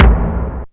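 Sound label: DRUMTRAKS low tom, Drums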